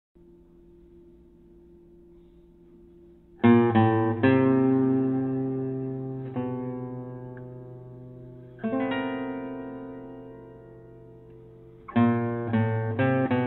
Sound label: Sonata of isolation for solo guitar